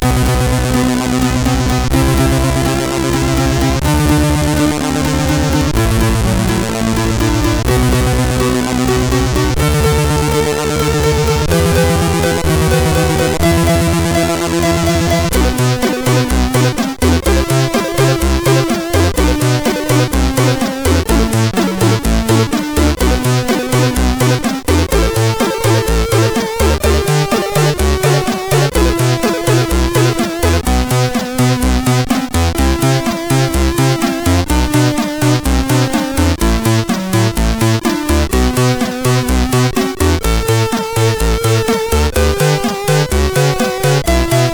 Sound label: sid CSG8580; HVSC; BSP-Sounds